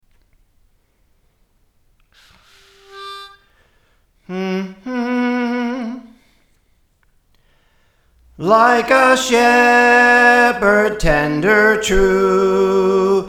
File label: Soprano; Praise For The Lord Training; Jesus Leads